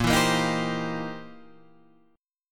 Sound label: C 7th Sharp 9th, A sharp